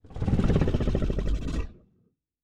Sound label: Growl, Monsters; Fantasy Sounds Bundle